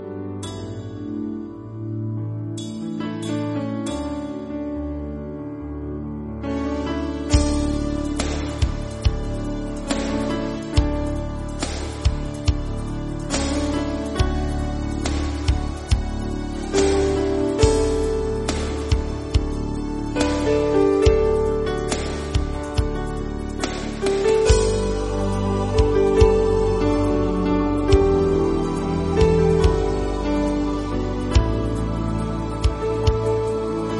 theme instrumental music; jeans movie

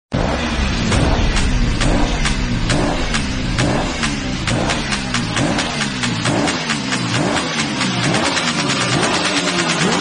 instrumental background music

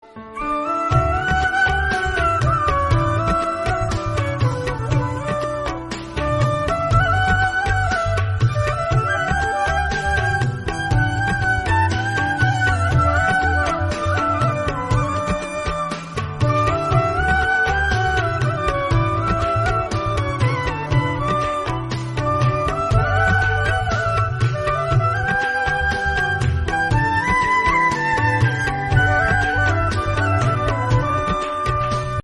flute version instrumental song

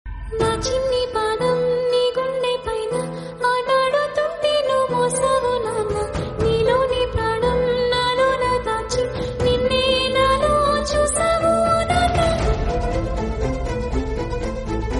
telugu

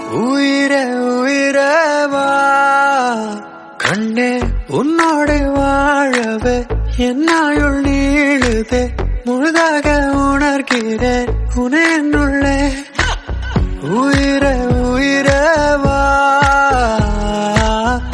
song tamil version male cover

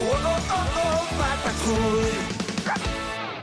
theme tone; paw patrol

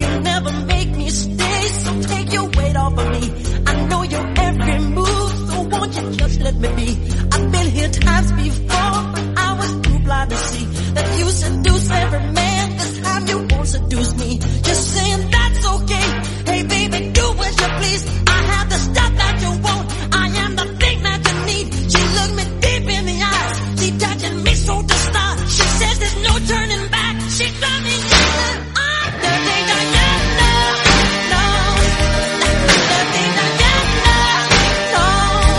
english song

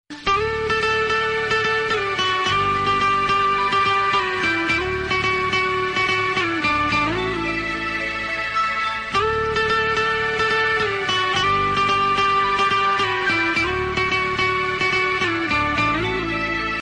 instrumental; aasai movie

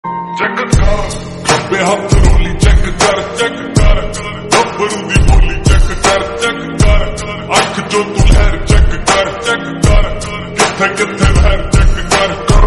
slowed reverb punjabi song

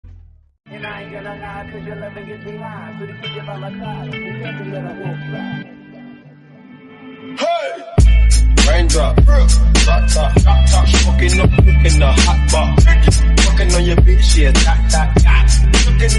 remix song

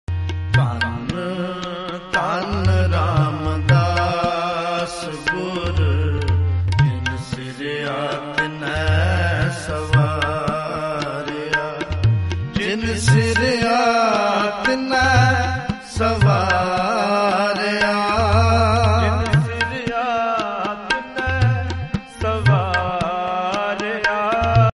devotional punjabi

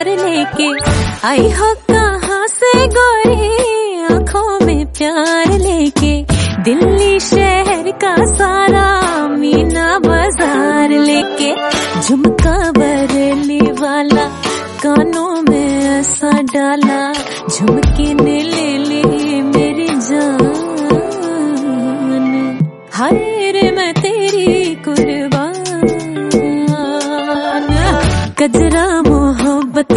female

